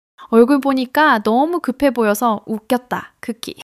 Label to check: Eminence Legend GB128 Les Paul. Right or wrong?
Wrong